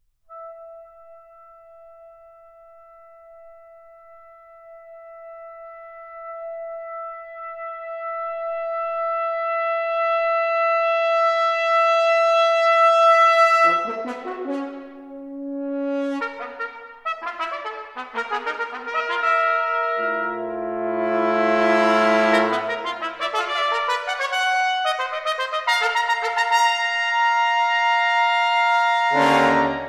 Triptych for Brass Quintet - III. Canonic Fanfares